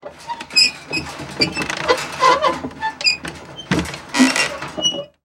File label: Industry Gate Metal Gate Rattle Creak